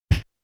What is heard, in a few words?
Dragon Body Blow (Small)